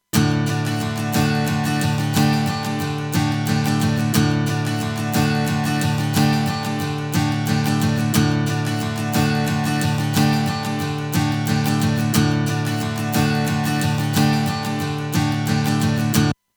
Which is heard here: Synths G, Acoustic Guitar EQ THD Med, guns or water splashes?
Acoustic Guitar EQ THD Med